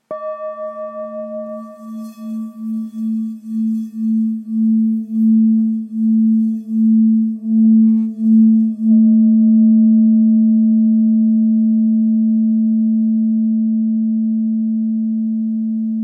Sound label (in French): Bol ancien la